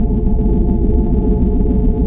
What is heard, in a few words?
circuits, ambience; cairn